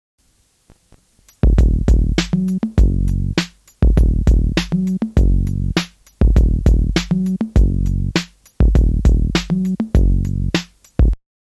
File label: Beat